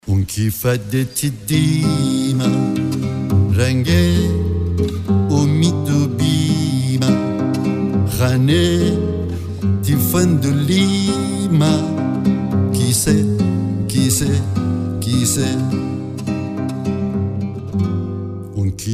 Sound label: Ringtone Singer Khareji